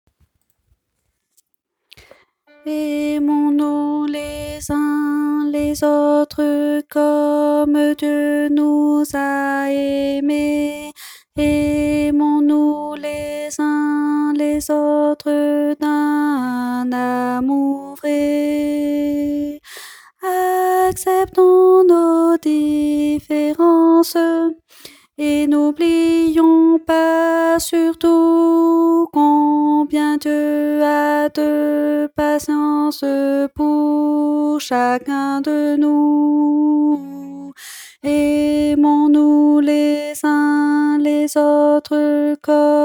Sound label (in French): alto